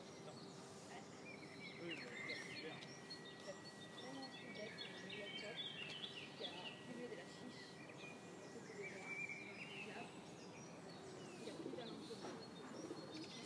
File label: Riad Rooftop Sunrise Sounds: Marrakech, Morocco